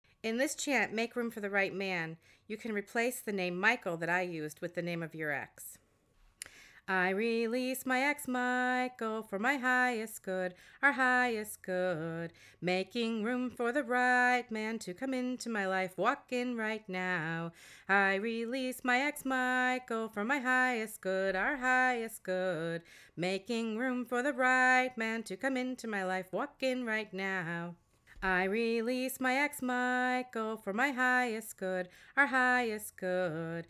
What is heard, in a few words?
Chants to Manifest Love